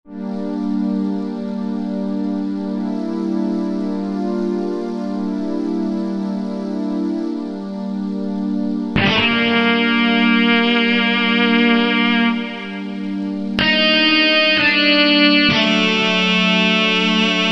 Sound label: Rainy Night Lead